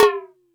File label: More Toms, E-MU Pro-Cussion